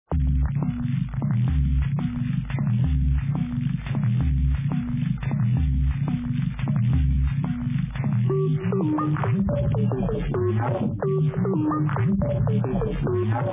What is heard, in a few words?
fat open